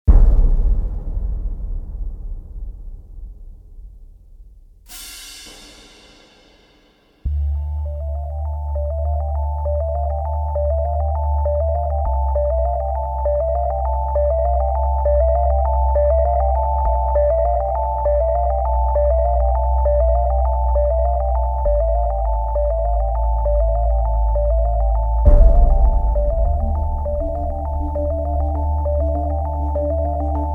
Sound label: ambient chill mix